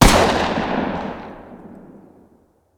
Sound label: fire 45 dist, uzi; weapons; Urban-Coalition; Decay; arccw ud